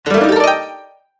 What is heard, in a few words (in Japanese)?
場面切り替え 琴 和風 上り